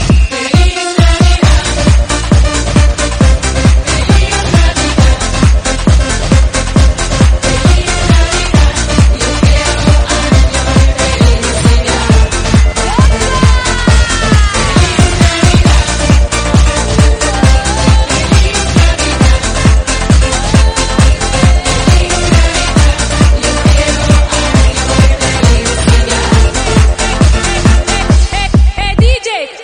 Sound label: disco